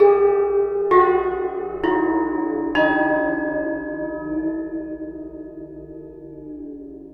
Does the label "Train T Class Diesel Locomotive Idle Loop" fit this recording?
No